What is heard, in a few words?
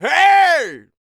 yell, male human, R&B dance vocal